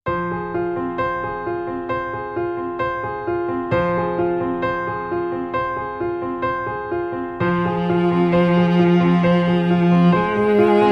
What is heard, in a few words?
Intimate